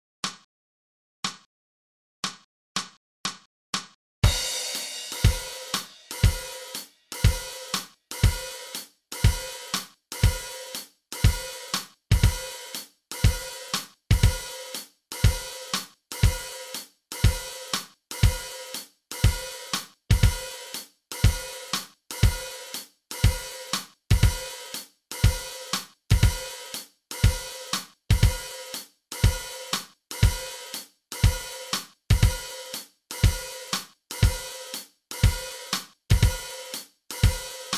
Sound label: The Blue Zone Drum Track